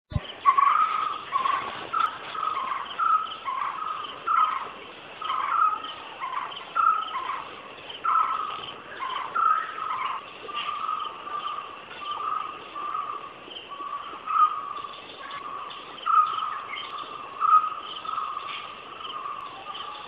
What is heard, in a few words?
Call of small green barbet